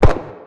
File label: mp5 fire dist; midnight guns